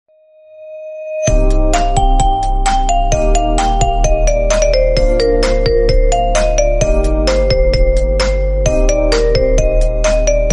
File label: marimba remix iphone remix new ringtone ringtone; lord murugan